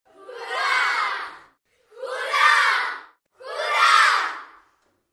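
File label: sounds and shouts of hurray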